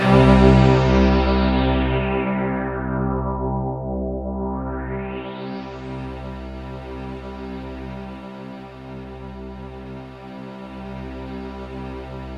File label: 7TH-WSTRG, KURZWEIL K2000